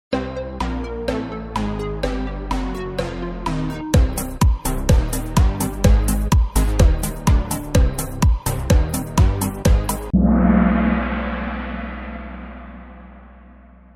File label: In Game Music (10 Second Count Down); Kahoot